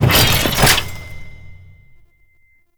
UI equip; Veilbreaker; Kahl Customization

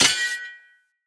Short Sword Medium Parry Impact, Weapon Impacts; Player; Swords; Combat

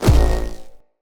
Hoverboard Land; Vehicles; Venus

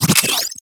Affinity Pickup; Warframe; Pickups

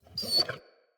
Wolf Cub Trapped Call Close

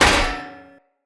Sphere Board Impact Default, Shields

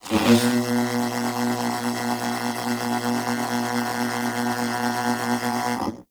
Tenno Animal Foundry Working Slow Up, machines; Liset Machines

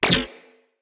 Shield Impact Default; Warframes; Volt

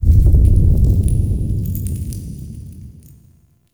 Interior Cave Deep Boom; Ambience; Warframe; Duviri

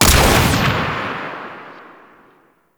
Sniper Rifle Fire; Warframe; Veilbreaker; Venkra

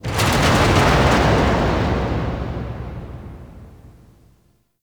Factory Thud, Ambience; Warframe; The New War; Zariman

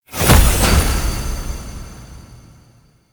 Boon Fragment Pickup; Duviri; Warframe; Pickups

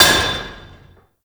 Worker Hammer Impact Metal; Warframe; Grineer

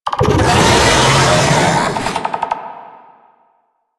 Attack, Vocals; Proto Infested Babau

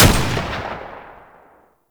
Shield Lancer Tutorial Pistol Fire; Warframe; Awakening Remaster

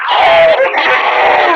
Enemy Death; Warframe; Lasrian; Battle Barks

